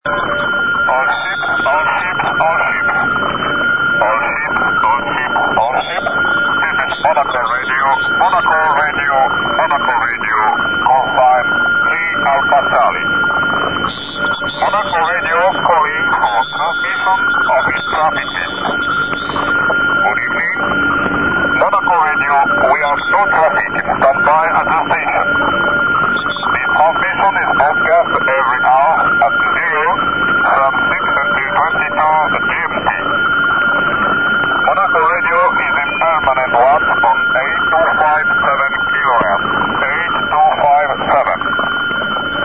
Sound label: monaco radio usb; monaco radio '3ac' usb (1982); this recording from 1982 gives you the complete working schedule